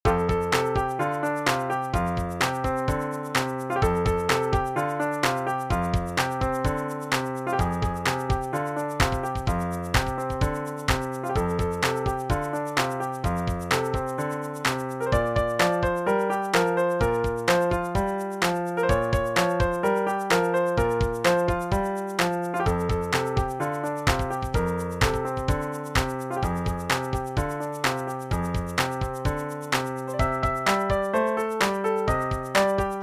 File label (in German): Zoom Out F, Klavier